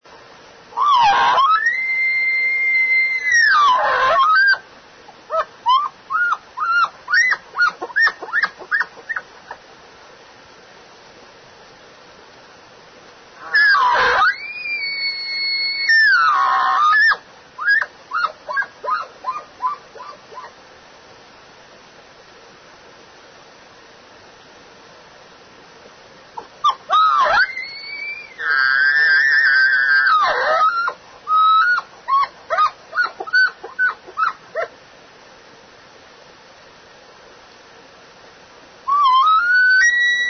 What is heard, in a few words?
bull, elk; elk contest; elk 2001